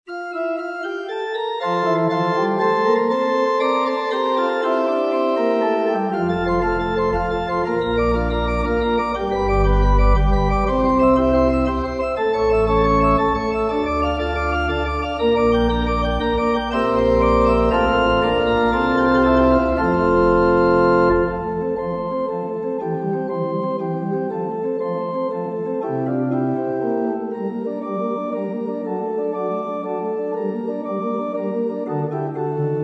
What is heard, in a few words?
Prelude, carillon; BWV 556; J.S. Bach; Allerlei 2004-2005